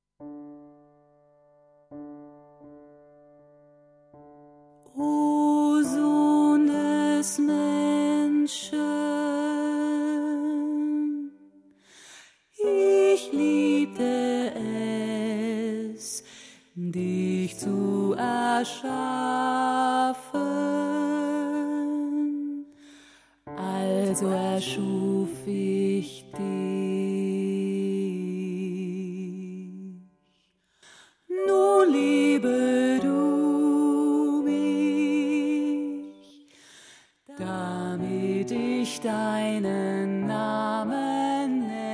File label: alto, choral music